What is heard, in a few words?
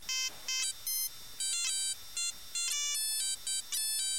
countryside ringtone; gta iv; Memes